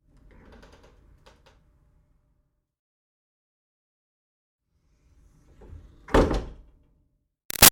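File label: Door Wood Office Push Med Open-Close; Miscellaneous Doors; BigRoomSound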